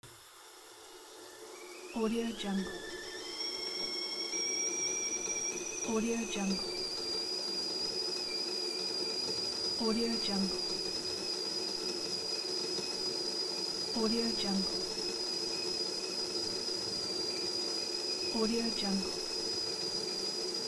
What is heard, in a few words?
kettle boiling